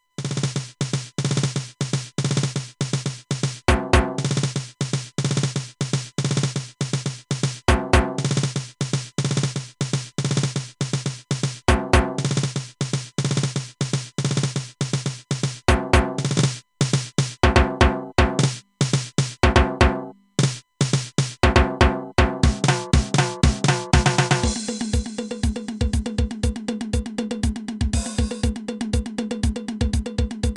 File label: batucada